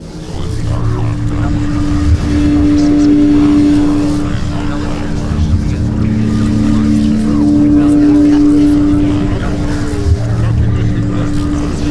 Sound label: Ancient Gate Active Loop